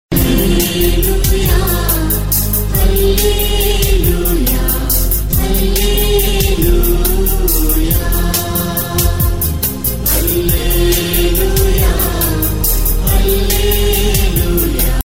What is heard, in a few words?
huawei ringtone